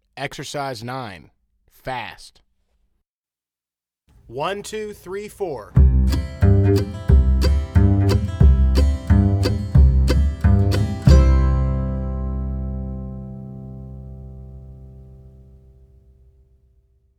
Exercise Fast

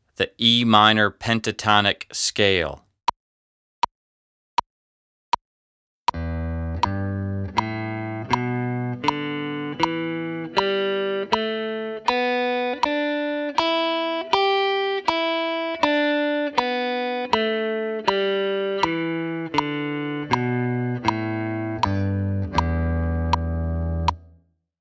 Em Pentatonic Scale

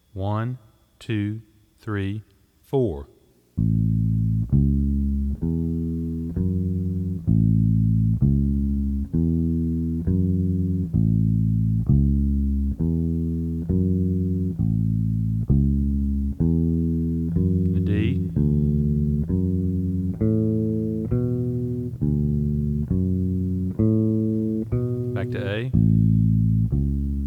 Slow

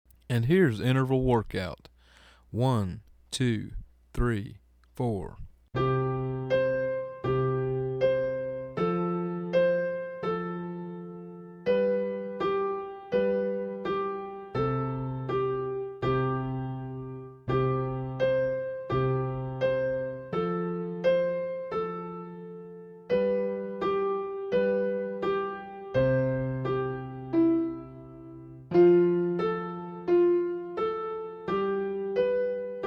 Interval Workout Slow

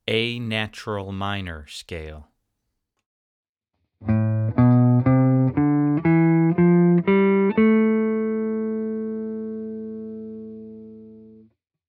Natural Minor Scale

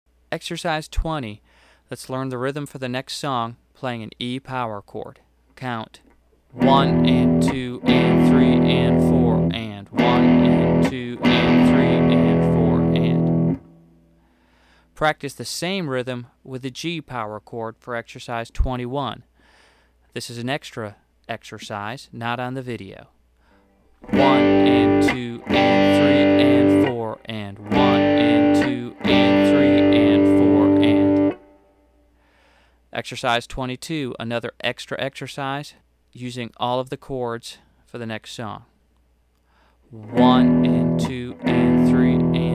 Open Power Chord Song

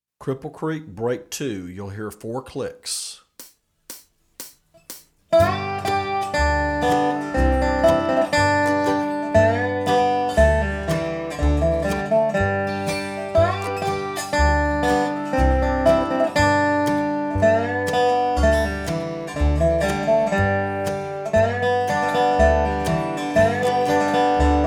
Cripple Creek Fast

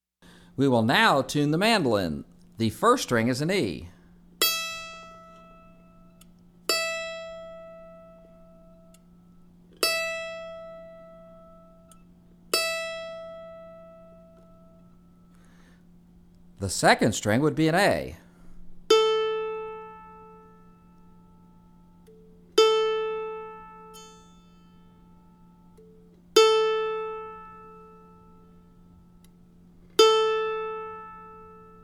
Tuning

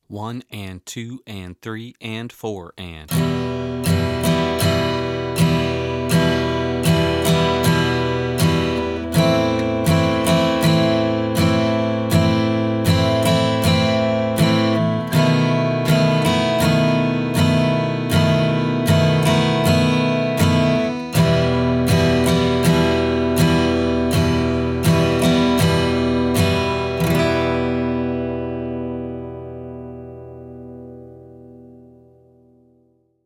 Minor Changes

